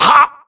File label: grunt death neck; Titanfall 2